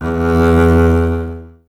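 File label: string contrabass; Miroslav Vitous; Solo Instruments VOL-2; Solo lnst. II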